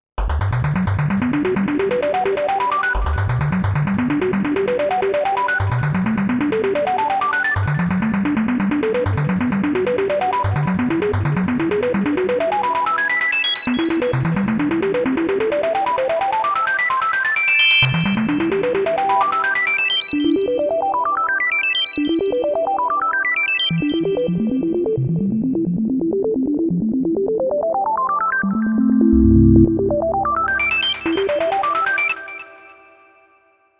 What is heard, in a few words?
Vintage Box, synth